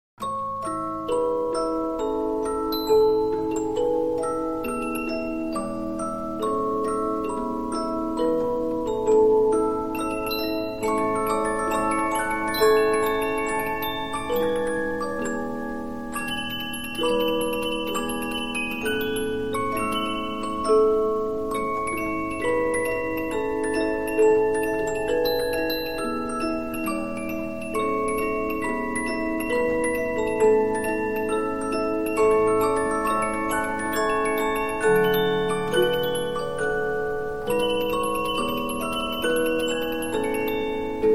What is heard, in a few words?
Polyphon, 15inch disc musicbox